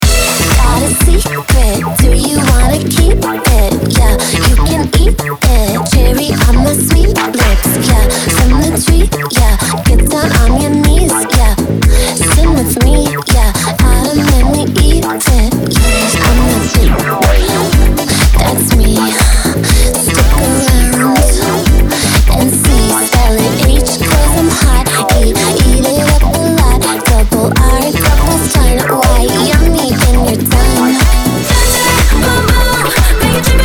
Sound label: Remix Clean 9A 122 Nu Disco Funky Bass House